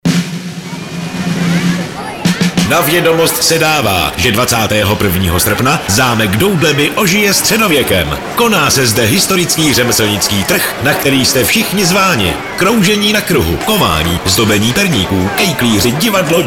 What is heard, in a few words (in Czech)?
trh